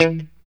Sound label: POP E 3, STRAT, Guitars; Spectrasonics; Hans Zimmer Guitars VOL-1; JC-STRAT POP